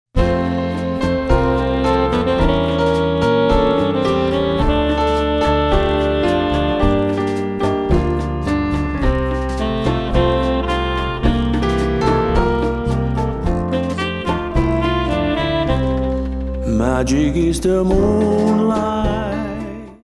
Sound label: Latin Dance